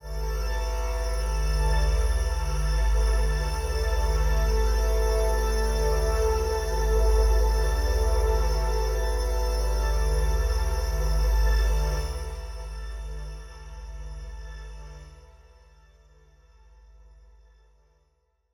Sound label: One Shot Drone A, dystopian drone; One-Shot Drones